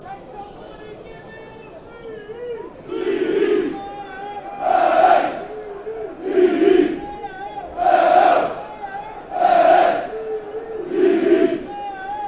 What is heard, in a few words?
spell it out c h e l s e, fanchants